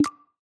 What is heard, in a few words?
camera click ringtone